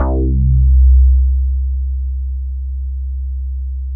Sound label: ROLAND JX8P, C2; Big Fish Audio - First Call Vintage Keyboards